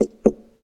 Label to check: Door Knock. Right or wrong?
Right